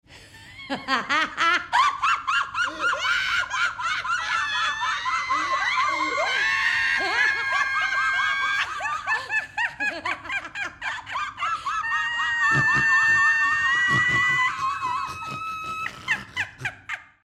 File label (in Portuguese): Orquestra Risofonica, Risadas Individuais